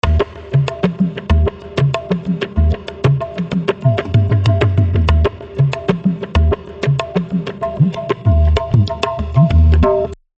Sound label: kendang